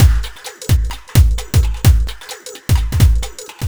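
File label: No snare, Drum Loops 130bpm; Zero-G; Soundclash Nu Dance Electro; Acidized Wavs; Dub Me Live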